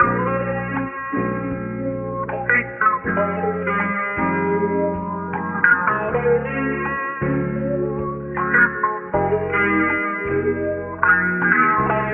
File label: guitar, Loops, Phonky